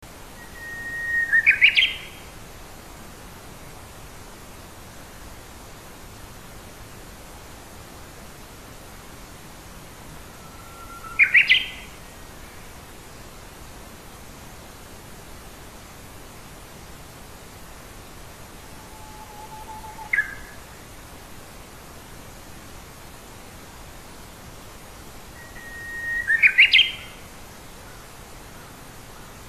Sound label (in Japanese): uguisu; ウグイス科; ウグイス （鶯）; 平成１６年５月８日 忠魂碑東側樹上で; 蘭梅山で見かけた野鳥を集めてみました。